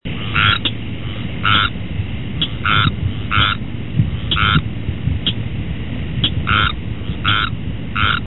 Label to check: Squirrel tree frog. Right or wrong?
Right